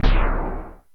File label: Explosion